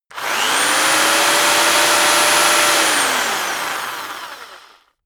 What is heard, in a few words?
rock drill rev medium very long; maschinen; bohrer